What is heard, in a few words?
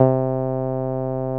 SIEL, String Machines